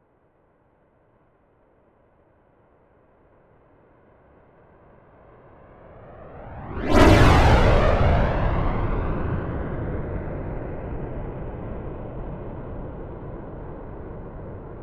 military jet rafale flyby left to right fast close